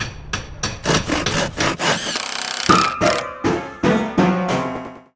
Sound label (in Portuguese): martelando; ferramentas